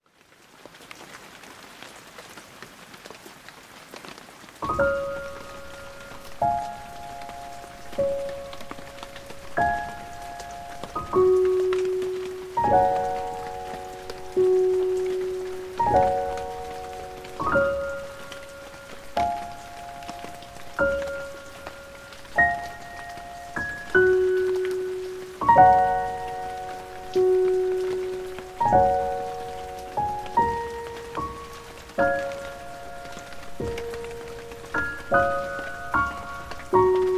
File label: Baby Sleep Rain Song, Piano in Nature